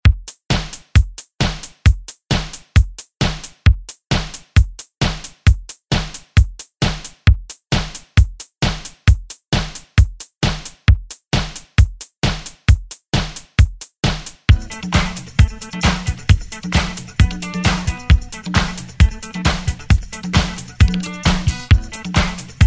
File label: 70s Remix